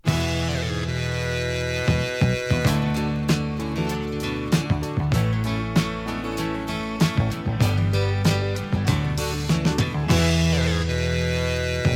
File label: topformat maxi jingle single